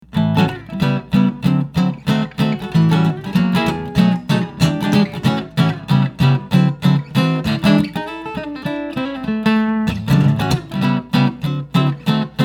thorell red sky, archtop guitars; steel string

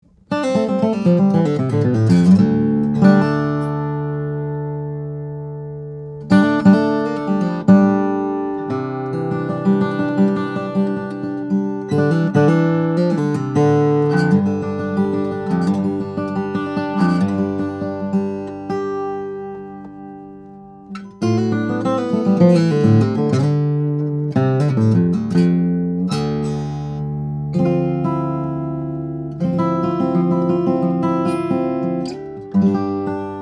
guitars; Olson SJ; brazilian rosewood; adirondack spruce; flattop

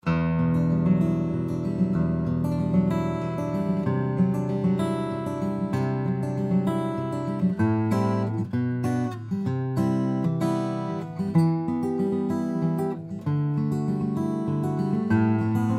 steel string guitars, flattop; loef d anam cara

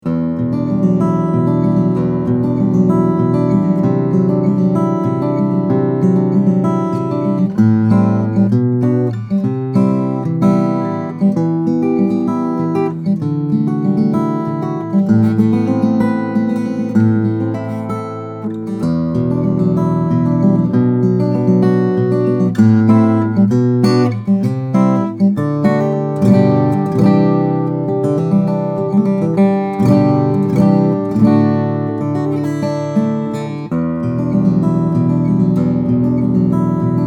ensor the auditorium, steel-string flattop guitar

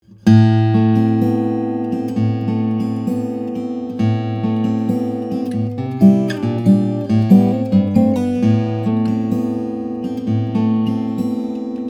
steel string flattop guitar; applegate; sj tunnel 14; macassar ebony; redwood

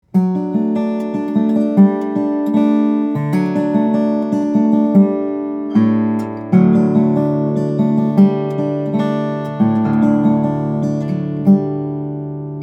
Casimi C2S, guitars steel string flattop; african blackwood; alpine moonspruce

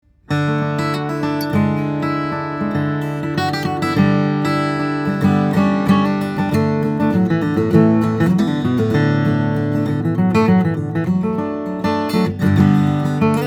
D-28, steel string flattop guitar; brazilian rosewood; adirondack spruce

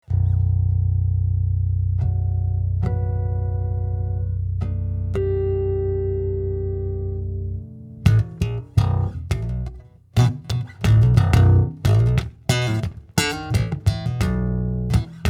emerald miotas flow bass, electric bass; Hand Carved Carbon Fiber Limited Series by Alistair Hay

